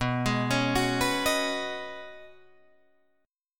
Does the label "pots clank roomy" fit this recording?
No